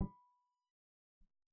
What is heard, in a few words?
Piano Harmonics; Piano Nudes